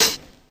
pedal hi hat sample e key, Royality free hat tuned to the E note. Loudest frequency: 6848Hz